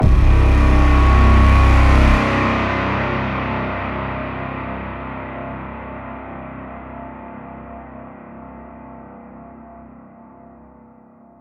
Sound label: Braaam Lifeless C